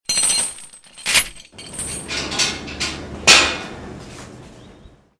metal clang; SuperTuxKart

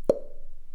plopp; SuperTuxKart

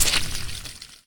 goo; SuperTuxKart

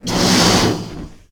inflate; SuperTuxKart

